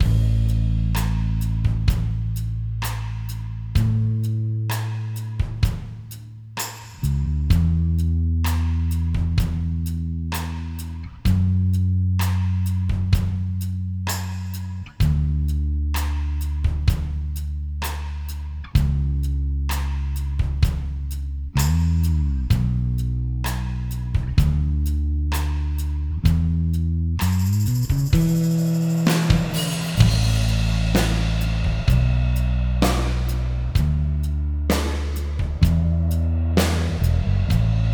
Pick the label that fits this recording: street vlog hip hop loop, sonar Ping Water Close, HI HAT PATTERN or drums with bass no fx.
drums with bass no fx